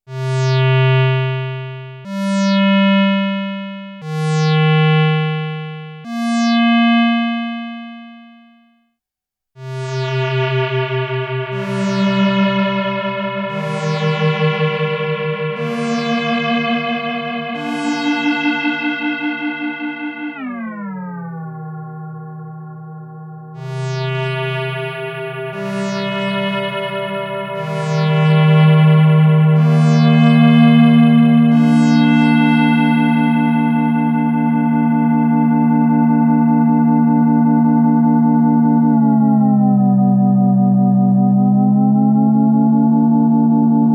KORG Solo Synth; KORG Integer

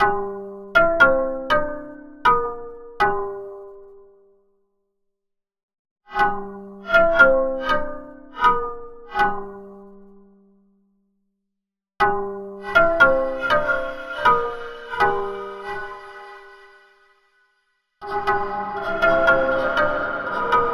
Bamboo Pipe

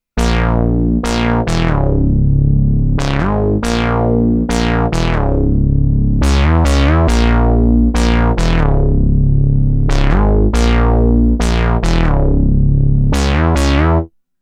KORG Odds Hybrid; prologue

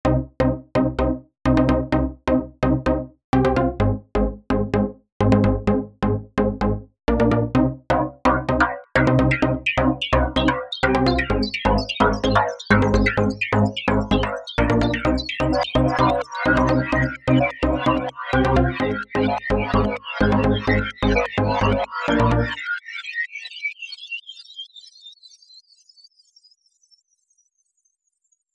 Space Rave; Multitude

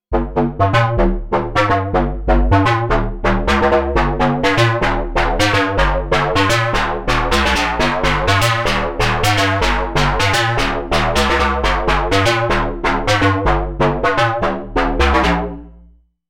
Mega Tube; KORG Tube

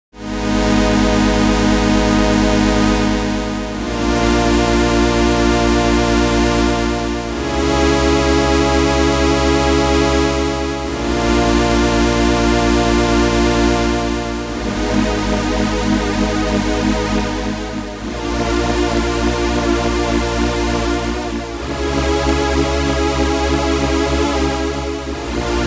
Synth Strings; Stator

